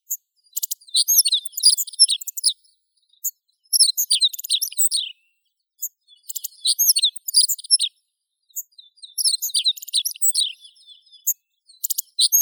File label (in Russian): ovsyanka krasnouhaya emberiza cioides